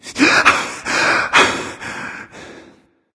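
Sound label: nonverbal breath; smite; voicepack; ullr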